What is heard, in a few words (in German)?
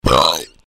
chilenischer teufelsfrosch